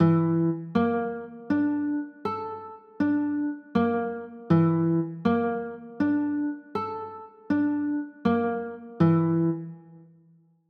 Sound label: c 6, chords